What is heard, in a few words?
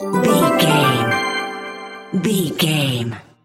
point sticker bonus score reward jingle